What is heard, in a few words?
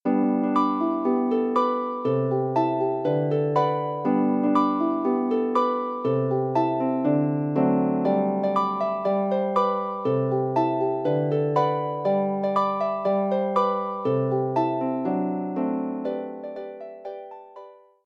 Scottish Traditional Tunes, harp; Top Ten Scottish Traditional Tunes